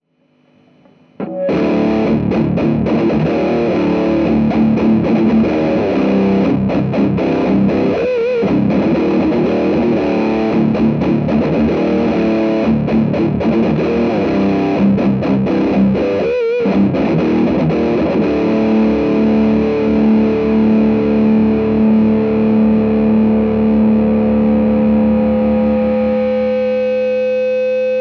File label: ultra lead; randall mts